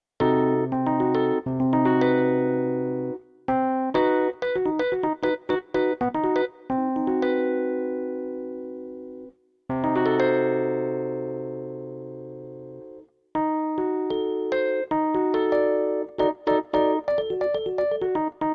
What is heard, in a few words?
on chord, jazz piano